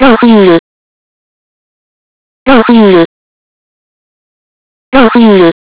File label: low fuel; FGUK; FGR2Phantom